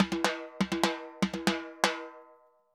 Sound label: Timba Poly, Drums & Percussion; Best Service; Festa Latina VOL-1